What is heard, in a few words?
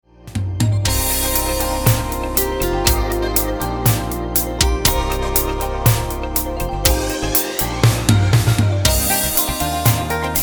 Atmospheric Groove